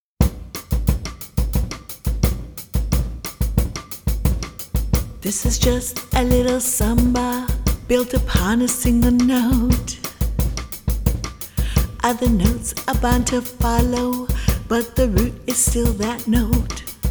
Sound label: samba